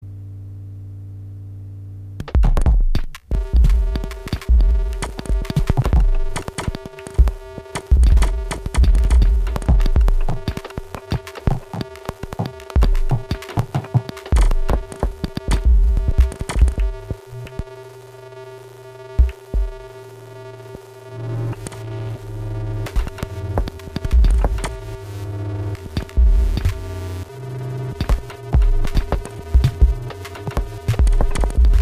mixer (soundcheck); tuesday nights

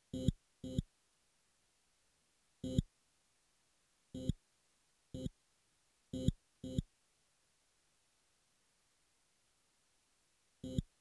030bpm; elektron machinedrum

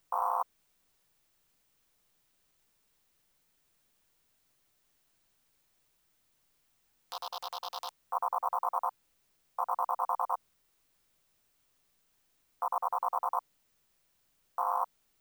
030bpm; elektron machinedrum

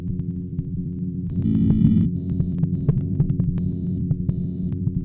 105bpm; elektron machinedrum

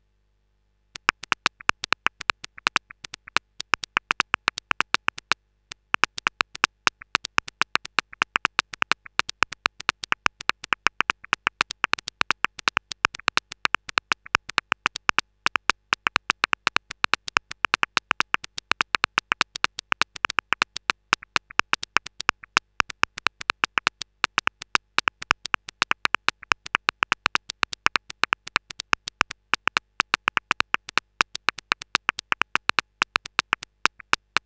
noisebeats; rauschpartikel

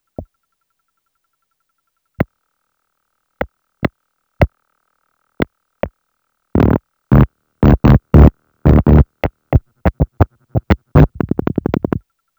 030bpm; elektron machinedrum

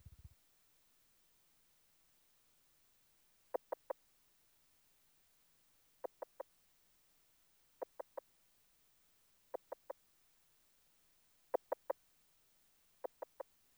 030bpm; elektron machinedrum

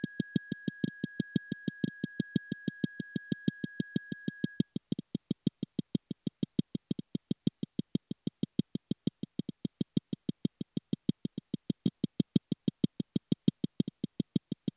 030bpm; elektron machinedrum

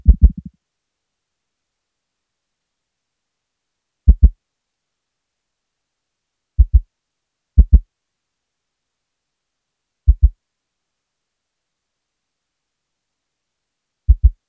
030bpm; elektron machinedrum

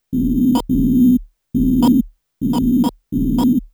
105bpm; elektron machinedrum

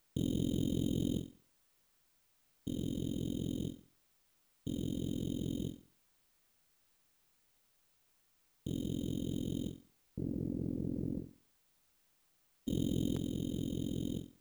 030bpm; elektron machinedrum

